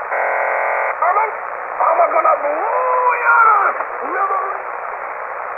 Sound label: 3700KHz USB